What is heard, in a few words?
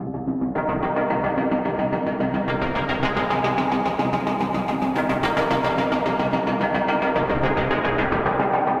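Racing Pulses, C minor 109 bpm; MIDI Clips; Tonal; Motifs; Ableton; Mood Reel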